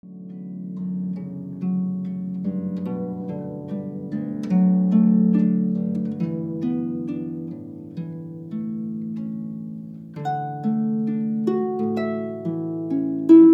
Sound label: canon in d